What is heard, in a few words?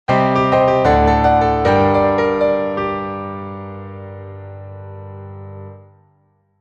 Failure, Jingle